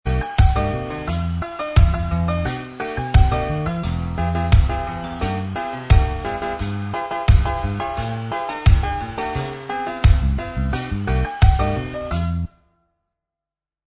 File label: Inst